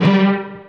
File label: heavy cello; Sonic Foundry (Sony Creative Software); Synchro Funk; One Shot Sonics